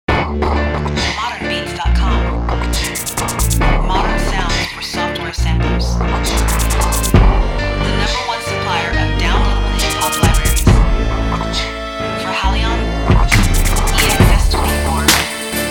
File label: RnB Keyz Chopz